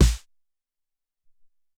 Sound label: Snr, Alesis SR-18